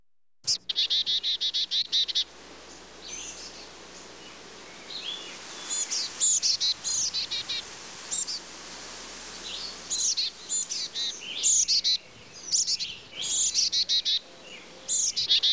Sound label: Birds, Chickadee; JERRY APPS WINTER